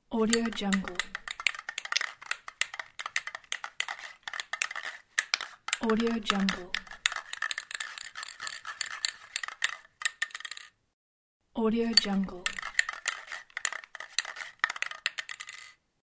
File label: shaking spray paint bottle